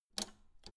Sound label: old button simple press